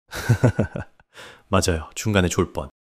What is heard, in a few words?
laughter